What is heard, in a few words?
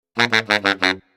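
wrong way, funny cartoon sounds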